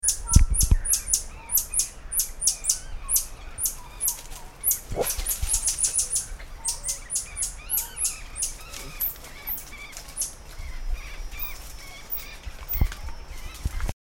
Ermitaño Canela, Phaetornis pretrei, Planalto Hermit; cantos

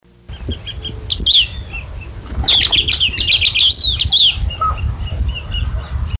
Suirirí Pico Corto, Sublegatus modestus, Southern Scrub-Flycatcher; PASSERIFORMES; Tyrannidae; cantos

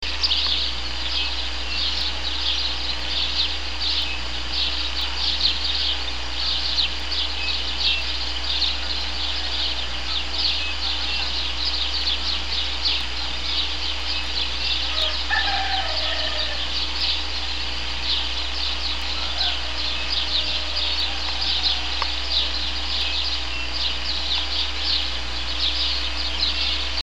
gorrion, Passer domesticus, House Sparrow; Ploceidae

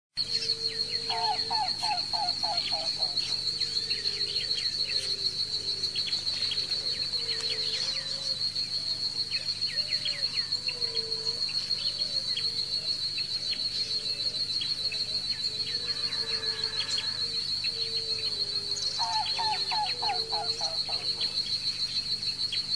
cuclillo comun, aves; cantos

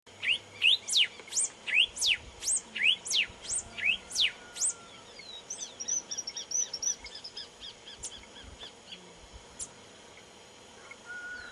cardenal amarillo, Gubernatix cristata, Yellow cardinal; cantos

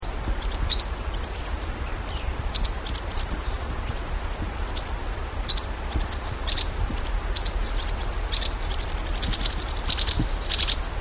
catiserrana, Catita Serrana Grande, Bolborhynchus aymara, Gray-hooded Parakeet; cantos

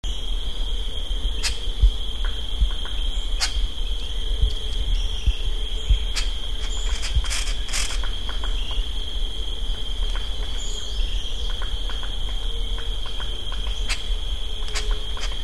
tuerechico, aves del chaco

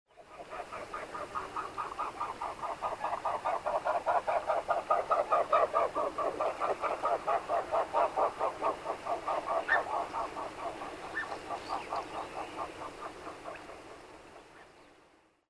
cisne negro, Cygnus melancoryphus, Black- necked Swan; Cisne Cuello Negro; cantos

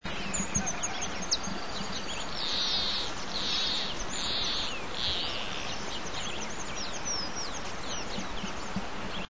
cortaramas, Phytotoma rutila, White-tipped Plantcutter; Cortarramas; Phytotomidae; PASSERIFORMES; aves

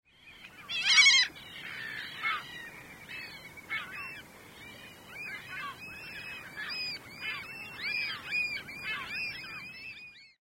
gaviotin gris, aves; Grey Gull; Larus modestus; cantos

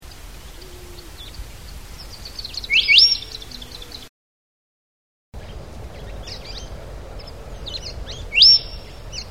Inambú Silbón, Nothoprocta pentlandii, Andean Tinamou; cantos

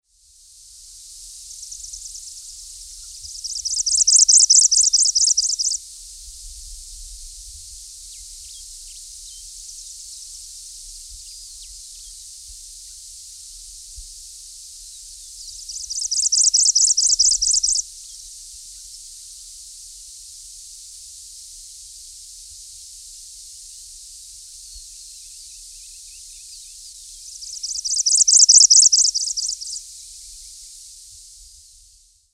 yal carbonero, Phrygilus carbonarius, Carbonated Sierra-Finch; cantos

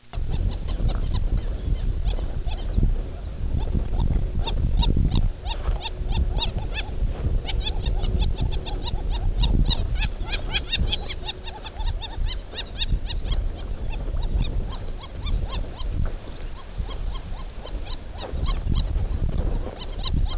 jacana, Jacana jacana, Wattled Jacana; aves; cantos; CHARADRIIFORMES; Jacanidae

